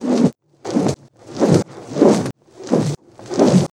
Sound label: Machine Ropes Loop